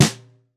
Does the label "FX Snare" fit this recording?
Yes